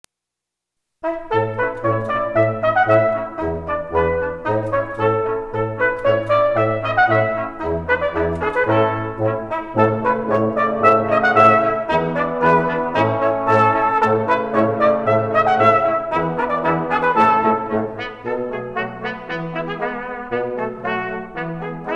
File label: Hop Waltz; Philadelphia